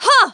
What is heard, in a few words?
kiai; MonsterHunter; Fight